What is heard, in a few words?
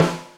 Snare Edge Undamped; drum hits; Ableton; Factory Packs; Drumdrops Recording Hybrid Kit